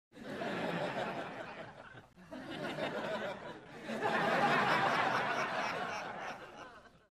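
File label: Crowd 100 Light Medium Burst, medium interior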